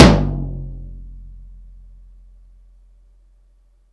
TOM E, Drum Dry; East Collexion; Drum 1

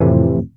wurlitzer, dx7

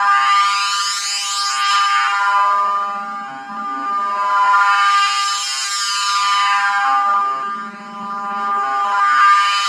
ROCK CHAIR, ROCKIN CHAIR; Spectrasonic Distorted Reality 1

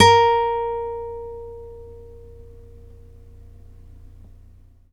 nylon P A#3, guitars; Synclavier Sampler Library

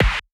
snare lifeforms snare body; Polyend; Recue

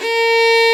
fiddle A#3; InVision Interactive; Lightware VOL-1; Instruments & Percussions

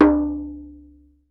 ISRAELI, World Assortment

